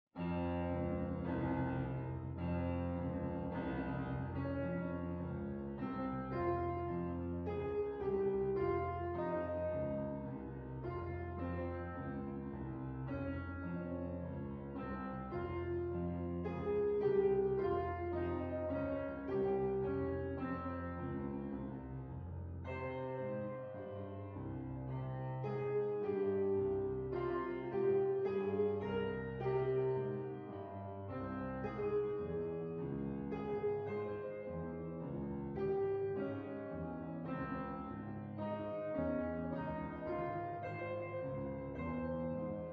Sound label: Piano Piece